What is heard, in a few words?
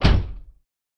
Car Door Slam Int Hit